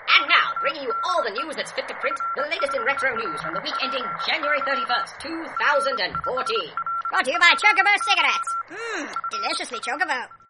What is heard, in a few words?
old timey